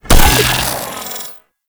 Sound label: Shield Down; Warframe; Captain Vor